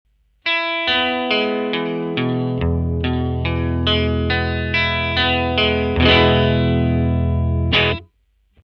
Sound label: jag 1963 neck